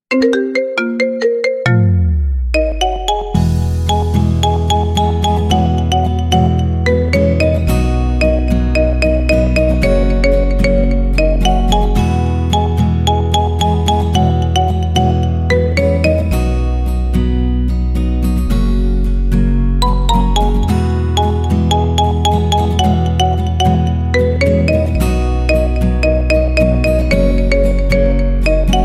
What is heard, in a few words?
Marimba